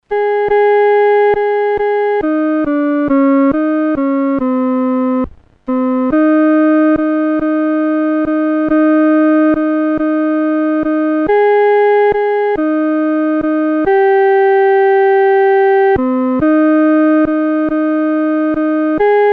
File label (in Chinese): 独奏 第二声